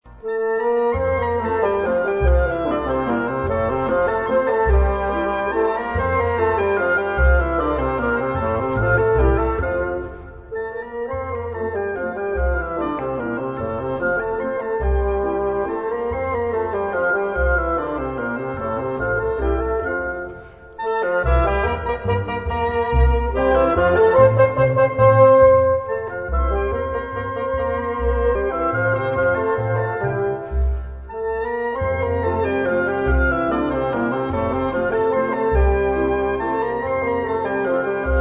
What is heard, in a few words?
trio